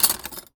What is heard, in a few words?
Foley